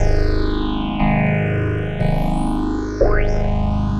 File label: Mogum Bass, Bass Foundations; Sonic Foundry (Sony Creative Software); The Electronic Music Manuscript Collection